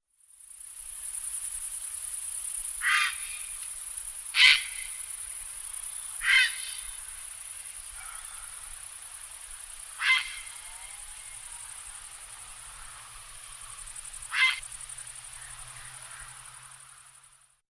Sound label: Ardea cinerea